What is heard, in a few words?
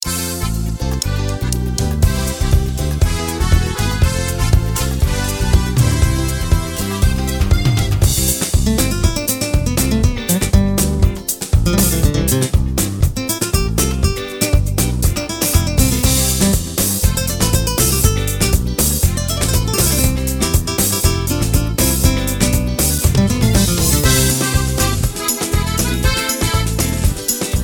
Soca slide; AjamSonic